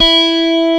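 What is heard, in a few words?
E3 Telecaster, guitar; AKAI S-Series CD-ROM Sound Library VOL-8; SET#1 GUITAR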